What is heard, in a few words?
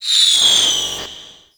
Projectile; Technorot; Warframe; Infested Lich